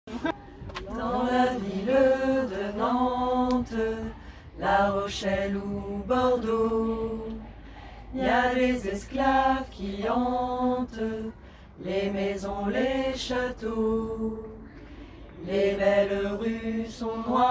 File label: Lead